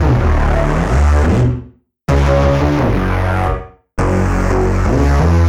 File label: Drum Bass